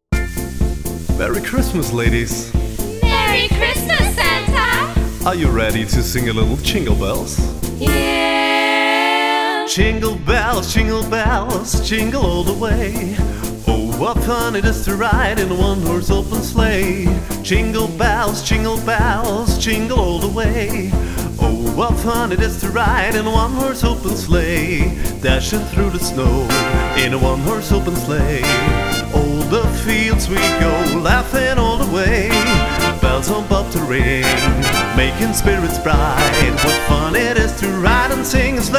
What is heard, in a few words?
Jingle Bells; MTA Version